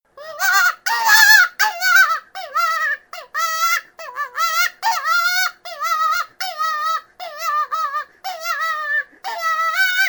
Cottontail Distress; Rabbit; ORION

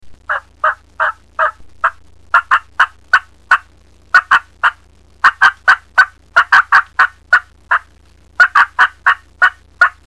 Turkey Cutting

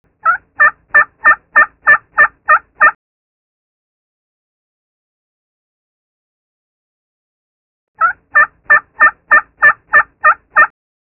Turkey Hen Soft Yelp

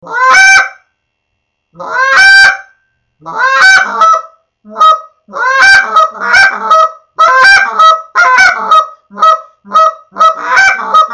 Canada Goose Greeting Call

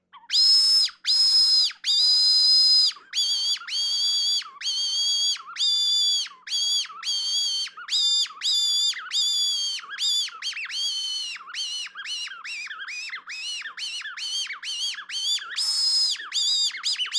Bluejay distress; Dave Kelso; Birds

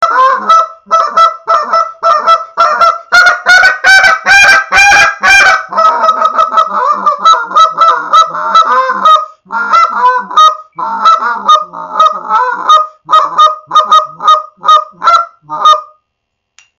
Canada Goose Come Back Call

